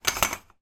household curtains on metal rail short movement; zapsplat

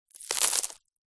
stones and dust handful drop sprinkle; zapsplat; foley

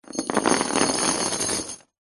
industrial chain med set down on wood panel; zapsplat

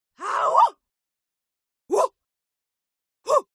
VOCAL Female Attack "Auh"; HUMAN; smartsound

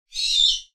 bird owl unknown chirp interior; sound effects one

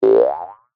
cartoon boing ascend jaw harp; zapsplat

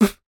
musical human beatbox snare drum

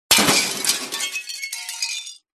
crashes metal glass; Audio Mangler

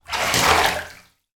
household bath water movement shallow; sound effects one

